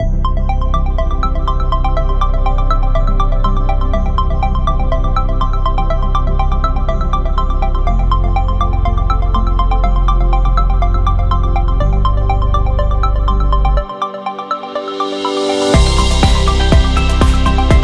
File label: Technology Corporate